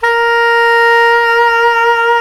Soprano SAX mp A#4; Giga Samples Collection

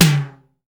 SDS7 KIT, Elec. Percussion; E-MU Producer Series Vol. 2; More Studio Essentials; Composer

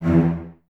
cellos E 2, String Ensembles, Stacc; Miroslav Vitous

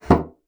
bassoon slap; Xsample VOL-6; C BASSOON

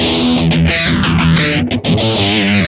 GUI 90 LICKS

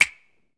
DRM OLD, Dance Synth; Sound & Vision - Gigapack I CD 2

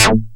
noise 2, sound noise; NOISE+ALARM

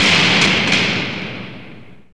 STEEL, METAL & WOOD; Roland - LA Composer vol. 1

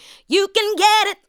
ADLIBS

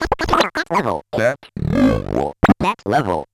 Bent Talking Teacher, Circuit Bent

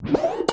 tape fx, scratch; Ambient Grooves

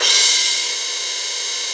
Crash Looping, Drum Hits; Zero-G; Soundclash

